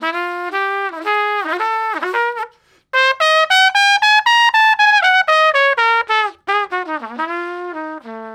115 Trad Jazz Trumpet _Ebmaj; Brass; Pro Tools Essential Loops; Digidesign

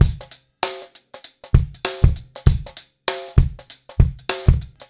098 Pop Drums; Pop Rock; Moderate Tempo; Pro Tools Essential Loops; Digidesign

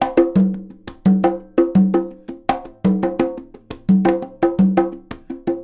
170 Dance Congas; Percussion; CongaBongo; Pro Tools Essential Loops; Digidesign; Dance DJ

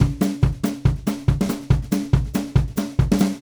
Country Drums (Pre Bridge); Digidesign; Pro Tools Essential Loops

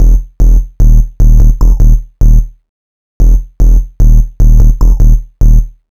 HipHop Fat Bass Emaj; Synthbass; Digidesign; Pro Tools Essential Loops

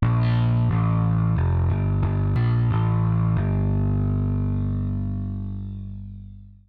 Bass; Pro Tools Essential Demos; Digidesign

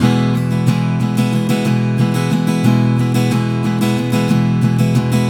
Pop Ac-Guitar Strum Emaj; Pop Rock; Acoustic Guitar; Pro Tools Essential Loops; Digidesign

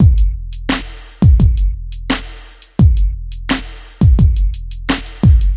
Hip Hop Beat, Drums; Digidesign; Pro Tools; Pro Tools Essential Loops

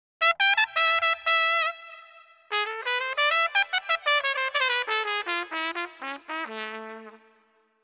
Jazz Trumpet Amin; Brass; Pro Tools Essential Loops; Digidesign

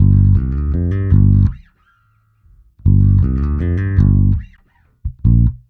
084 Pop E-Bass Gmaj; Pop Rock; Pro Tools Essential Loops; Digidesign

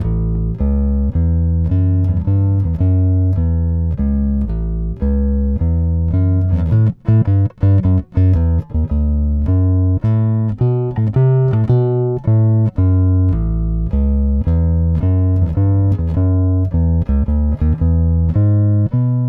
Blues Bass; Digidesign; Pro Tools Essential Loops

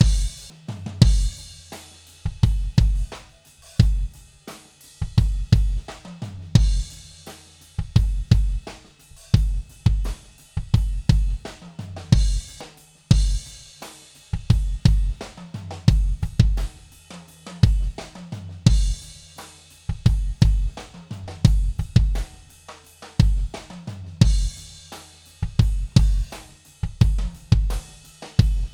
Kick Comp; Pro Tools Essential Demos; Digidesign

